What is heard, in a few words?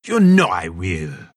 pangolin move pain